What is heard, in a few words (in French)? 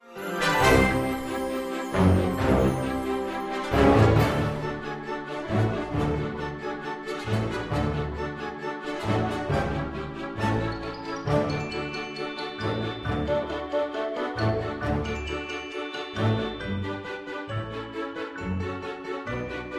marche; cendrillon